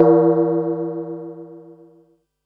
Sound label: Waveforms, Pearl SC-40